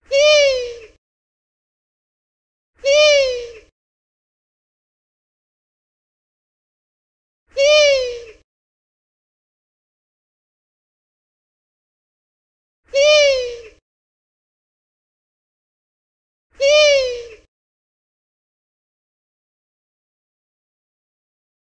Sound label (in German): Vogelstimmenspiel Waldohreule